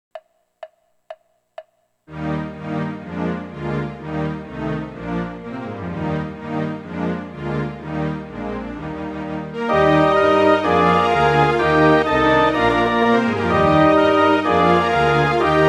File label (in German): hornkonzert orchester; mozart